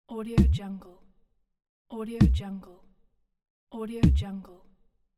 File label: jumping bubble